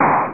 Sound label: eraser